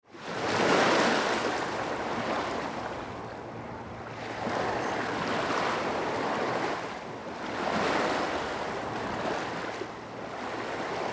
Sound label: Audio Waves Lapping on Beach